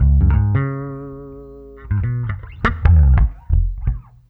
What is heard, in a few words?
BASS, Loops; East West; Funky Ass Loops